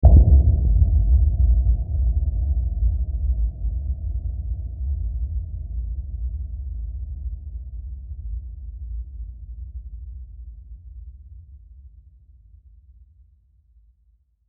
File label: Hit Filter Dark, Hit Filter Dark Deep; Blastwave